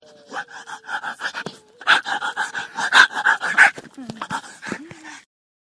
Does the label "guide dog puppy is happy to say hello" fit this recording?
Yes